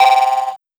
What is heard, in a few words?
bloop, Cartoon